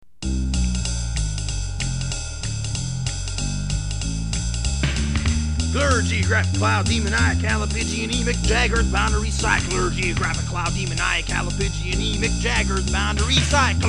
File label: jazzy lil ditty